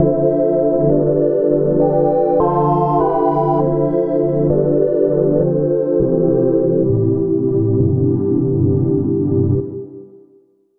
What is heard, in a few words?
Pad Calm